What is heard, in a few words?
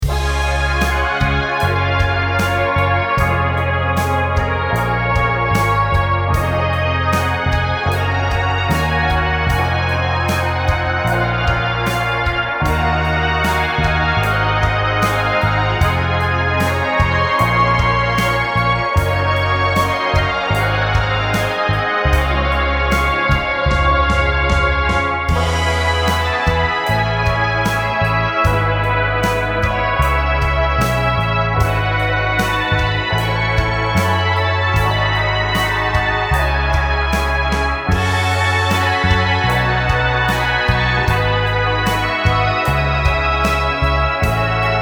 trumpets